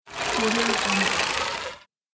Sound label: roll up